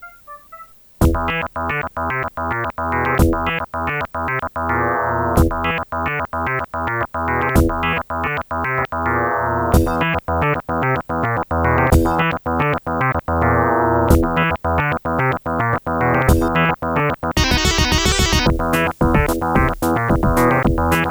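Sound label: Orchid NuSound PnP 32, Sound Blaster; 44 kHz stereo FLAC recording; Doom; Speaker out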